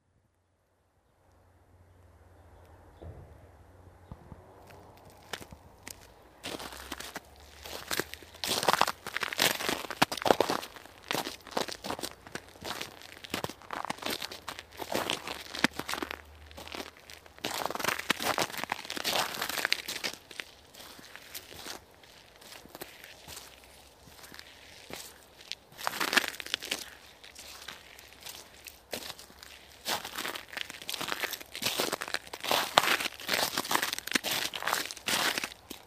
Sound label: woods near Hebborn, steps in the snow; steps in the snow. recorded in early 2002. Hebborn/Bergisch Gladbach/Germany.